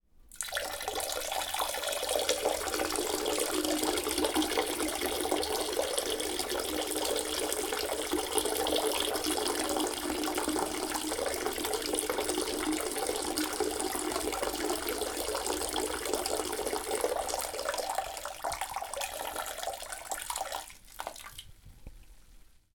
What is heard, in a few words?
Pee, household sounds